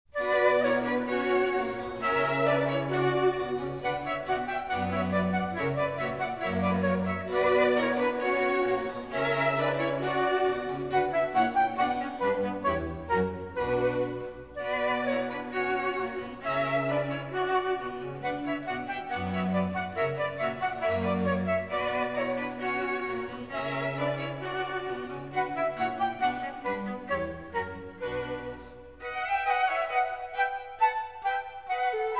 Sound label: Orchestral Suite - Rondeau; Bach; Orchestral Suite No.2, BWV 1067